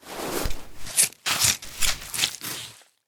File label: harvest use; STALKER; IWP; item usage